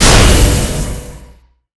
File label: magic missile impact; skibidi wizard